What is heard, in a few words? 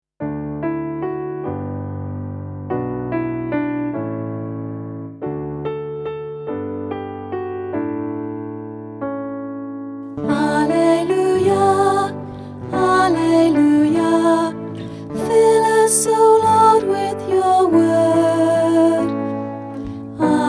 Gospel Acclamation